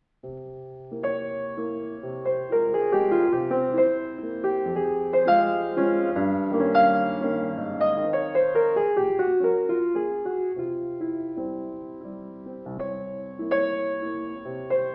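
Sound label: Chopin. Mazurka f-moll Op.63 No.2, piano; Heinrich Neuhaus 1953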